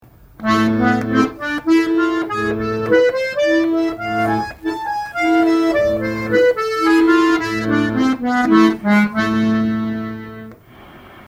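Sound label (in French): gamme la mineur harmonique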